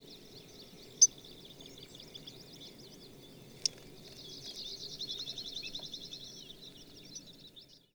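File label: Black Redstart flight call; Hausrotschwanz; Flugruf; Brocken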